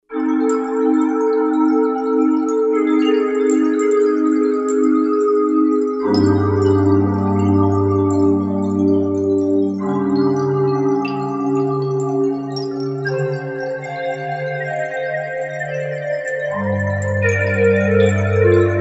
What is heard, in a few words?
Asian Rain